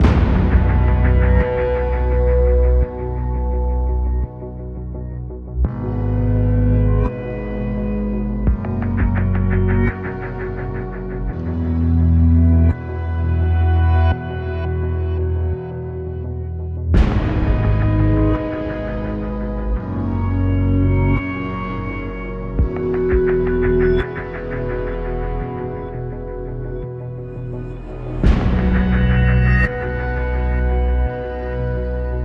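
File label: Music Ambient, Ambient Post Race 85bpm; Forza Motorsport 6 Apex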